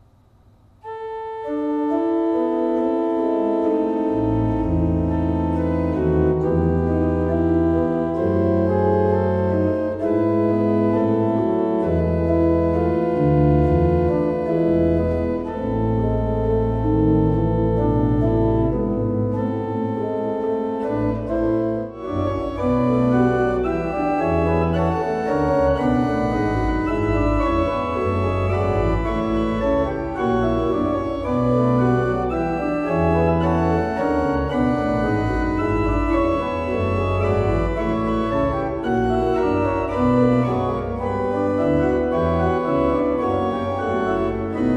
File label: Verschueren, pipe organ